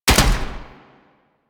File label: SMG Alt Shot; Weapons; Warframe; Tenno; Sundial